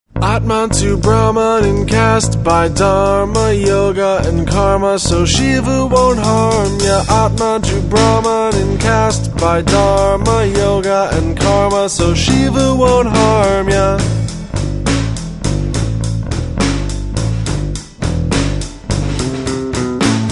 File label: triplets; hindu